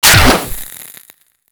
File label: Bow Fire; Warframe; Weapons; Stalker Bow